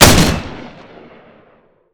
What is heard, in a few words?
stryker; weapons; ModernWarfare